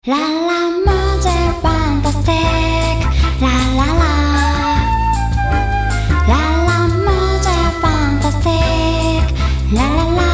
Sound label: lounge pops, mondo lounge